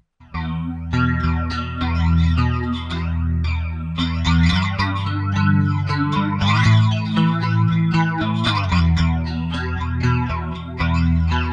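PHASE CHORUS, Zoom 506 II bass effects factory preset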